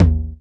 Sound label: tom, dance drums; Best Service; Dance Mega Drums; TOMS